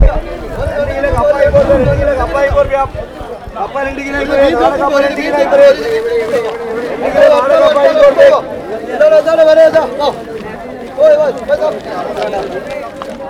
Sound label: market chants kannur, South India street recordings